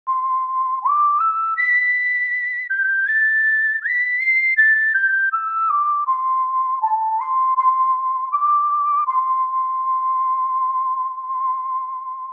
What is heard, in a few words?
whistle; Yo-Kai Watch 3; 3DS